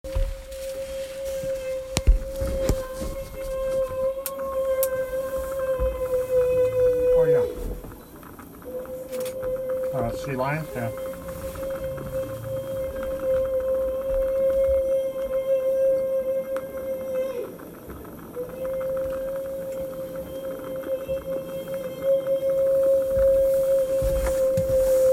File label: Feeding call